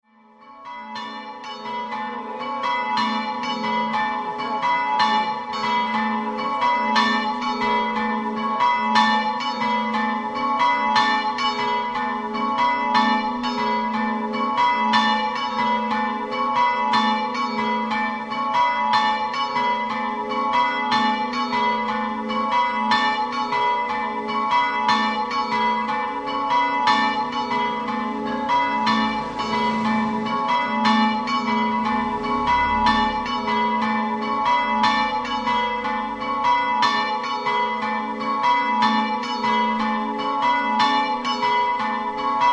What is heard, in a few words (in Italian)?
carraria, campane